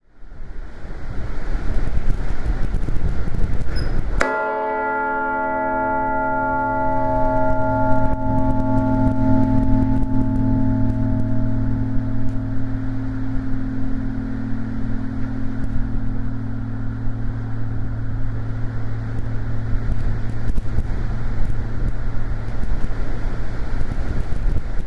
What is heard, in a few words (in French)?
timbre